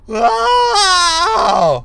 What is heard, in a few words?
zombie man